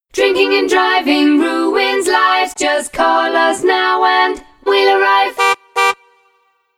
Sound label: drink drive jingle